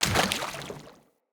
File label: Wolf Steps Land Water, Jump; Animals